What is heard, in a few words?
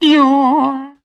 eeeaww, voice; ooh ahh